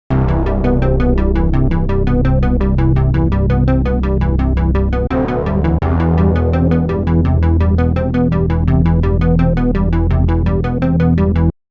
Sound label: Melody Arp